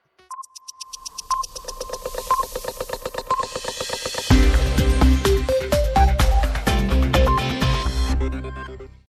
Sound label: musik